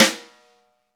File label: SNR, Roland; Real Snrs